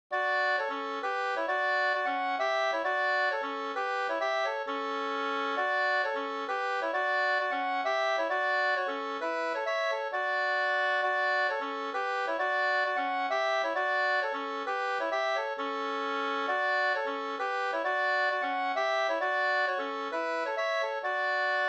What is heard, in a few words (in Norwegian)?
pols; Pols hass Karl Jan no 2st